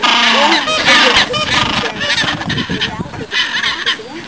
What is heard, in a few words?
gentoo penguins; Antarctica